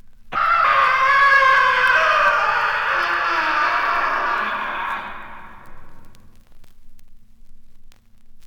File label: three men screaming